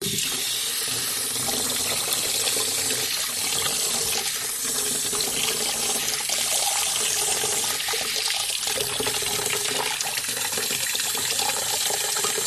household utility room sink fill plastic bucket with water hand in flow movements; zapsplat